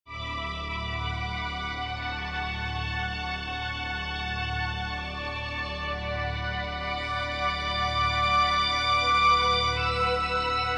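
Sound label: Didgeridoo Concerto; 2nd movt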